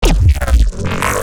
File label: Reese; neuro; BIG5AUDIO